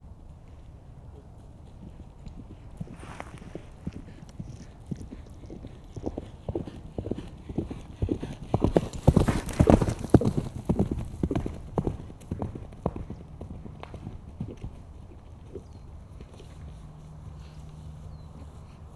sieto lovas balrol, lo; állathangok; paraszt udvar

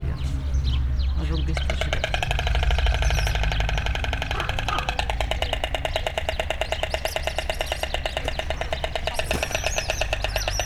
kelepelő fehér gólya, vetési varjú, egerészölyv; Hortobágyi Nemzeti Park 2013

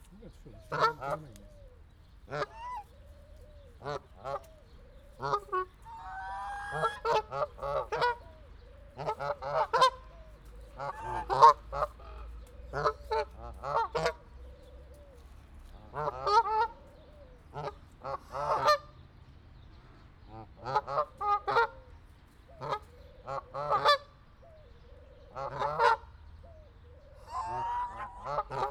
egeszen halk a kinai hattyulud erosebb hangu a kanadai lud; debreceni zoo 2019

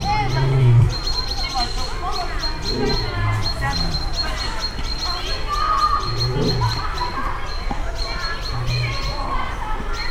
hím oroszlán távolról; szegedi zoo 2011

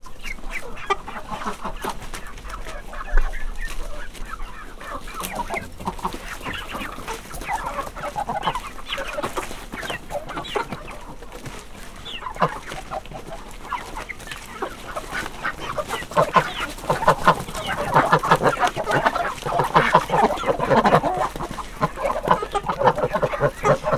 baromfietetés; parasztudvar

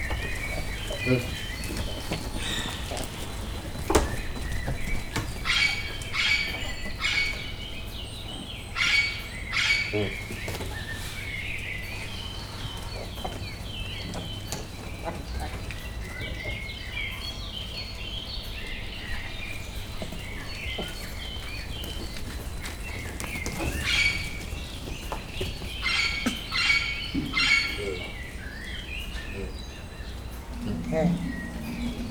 futkosnak, galléros pávián; pecsi zoo 2017

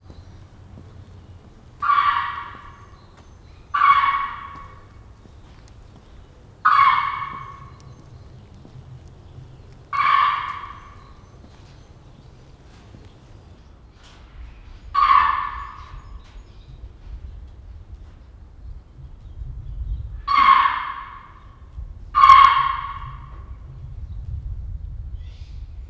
rikolt, aranyhasú mangábé; debreceni zoo 2012; marantz puska

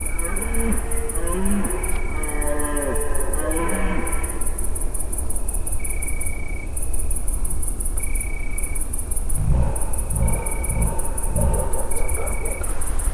gímszarvas és dámszarvas; termeszetben

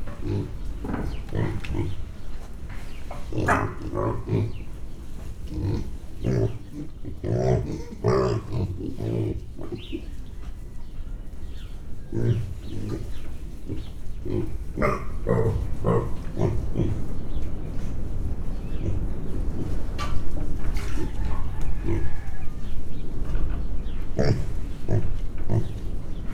10 hetesek röffentgetnek, mangalica; Hortobágyi Nemzeti Park

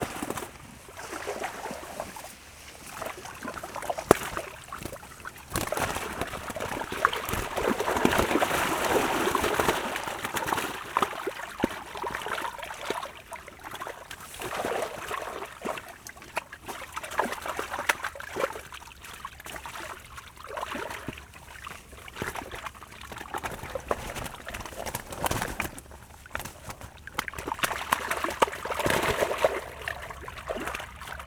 szakban ficankolnak, ponty; termeszetben; recsk

